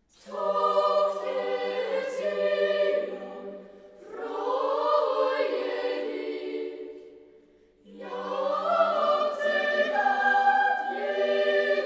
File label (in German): Tochter Zion [Arr. for Choir & Chamber Ensemble]; Sacred Choral Music; After Handel's HWV 63 & 64